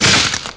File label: jack frost nova, effect; hyper novice